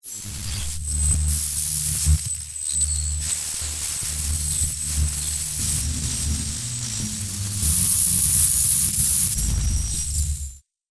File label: Electric Discharge, Magnetic, Science Fiction; BlastWave